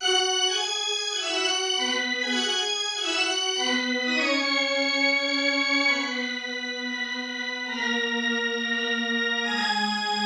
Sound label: organ